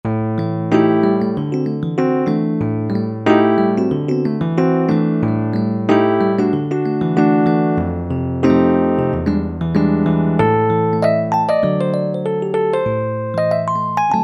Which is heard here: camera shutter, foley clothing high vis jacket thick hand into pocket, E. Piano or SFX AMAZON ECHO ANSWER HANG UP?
E. Piano